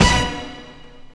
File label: HIT, Drum & Bass; Ueberschall; Drum & Bass Flash Trackz